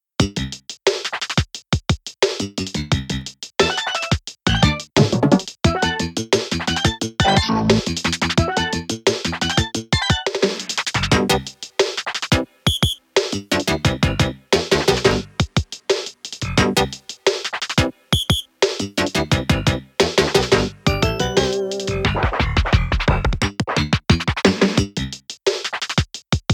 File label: Awesome Rap Beat; Video Game OSTs